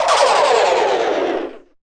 MOOG SLINKY; Distored Reality

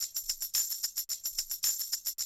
110, Classic Drum Loops

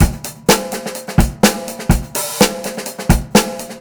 beat, Roots Funk; USB Soundscan vol.41; AKAI

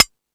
Beat Box; E-MU Pro-Cussion

